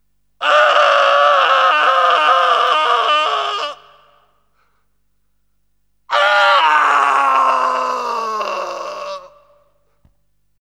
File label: Screaming Man; Halloween Sound Effects